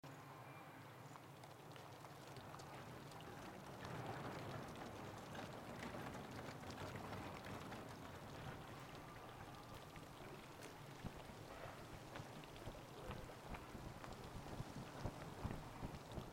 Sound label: amb bank loop; ambient; everdawn